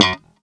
gb3 staccato, glowly bass

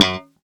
gb3 staccato, glowly bass

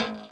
db2 release, glowlybass

